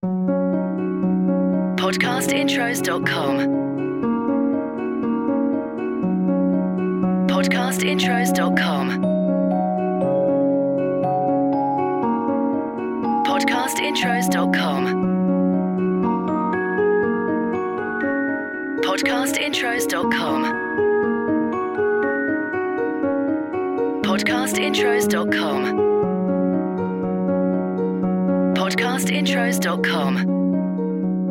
CHILDREN; Podcast Watermark

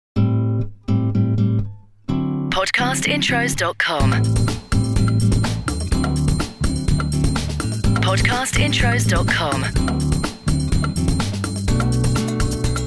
LOUNGE SWING Latin; Podcast Watermark

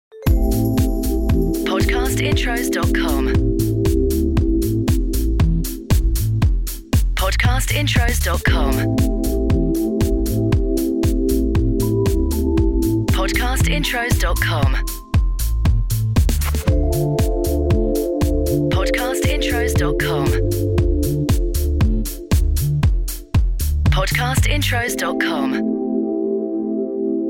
CHILL OUT; Podcast Watermark

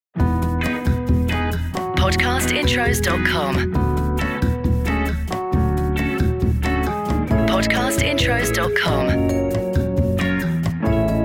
LOUNGE SWING; Podcast Watermark

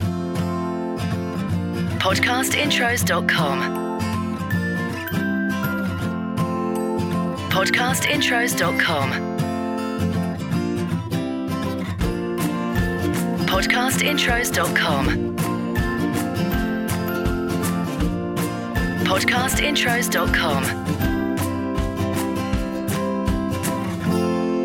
Podcast Watermark FUN

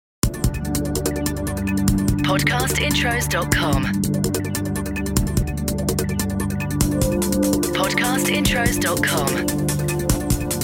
BREAKBEAT Concept; Podcast Watermark

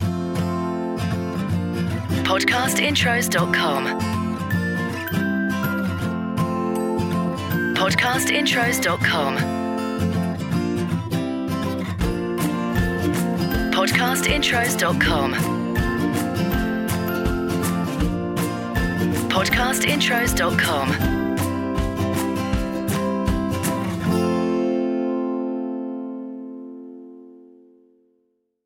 MOTIVATIONAL; Podcast Watermark

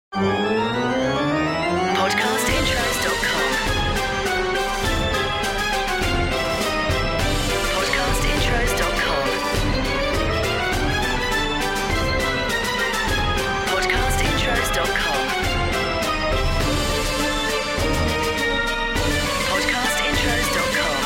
CHILDREN; Podcast Watermark

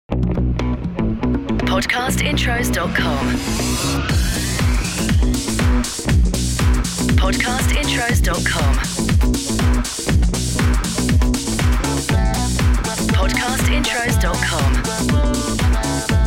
DANCE; Podcast Watermark

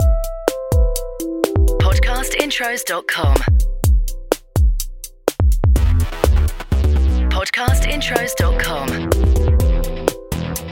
CORPORATE; Podcast Watermark

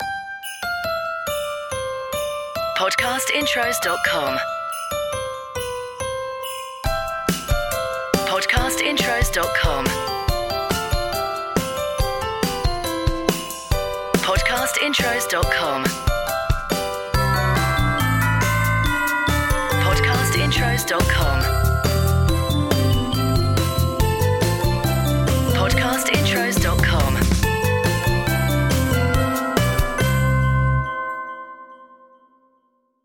CHRISTMAS Deck the Halls; Podcast Watermark

